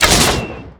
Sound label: Crossbow Magic Shot; Weapons